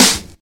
raw snare drum b key, snare single hit tuned to the B note